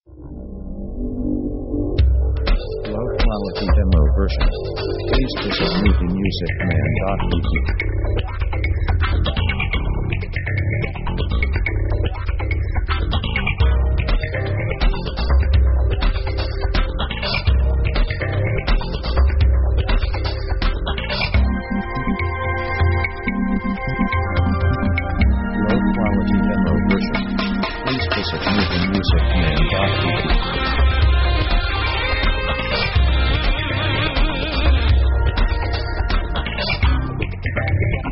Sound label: fusion action